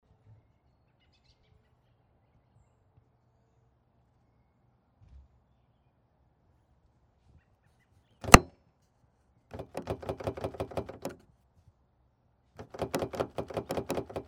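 Locked Car Door Handle, Locked car door, desperate to get in.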